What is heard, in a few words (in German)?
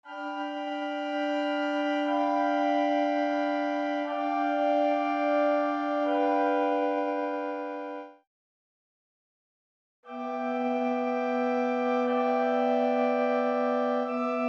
Zweite Verwechslung 643 Accord